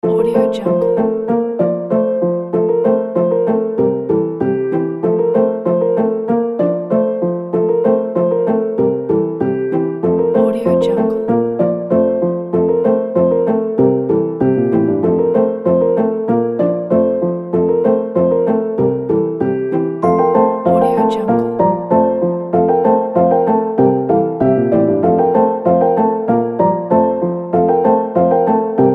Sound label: happy children fun